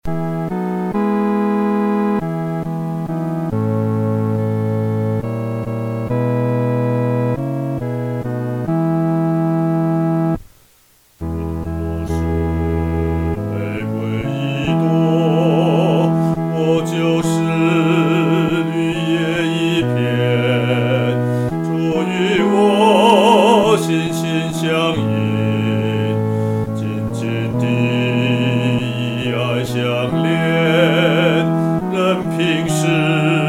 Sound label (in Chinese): 独唱（第四声）; 主若是